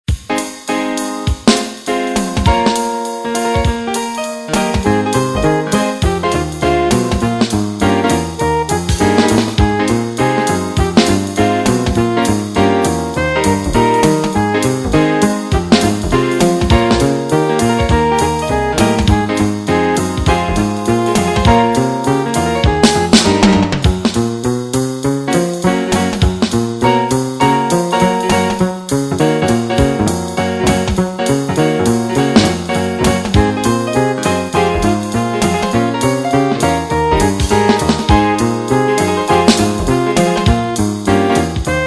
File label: Music Fun; GoJoe PoGo